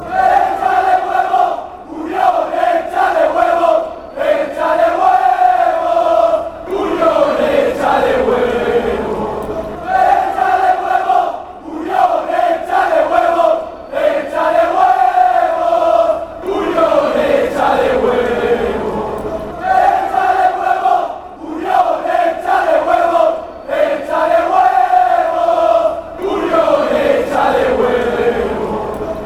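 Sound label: break, football songs and soccer chants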